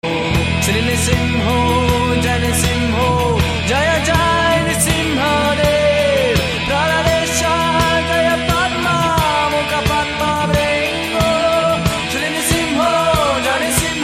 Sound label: Narsimha Aarti